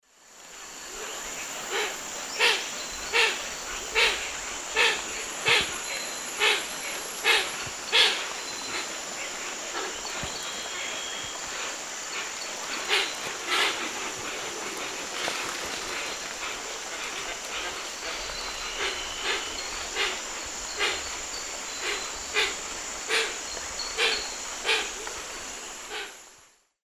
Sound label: Opisthocomus hoazin, calls; S. America; Eastern Lowlands